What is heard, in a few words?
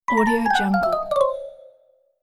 cartoon ladder climb down